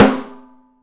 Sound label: OPEN PICOLO, 3D Drums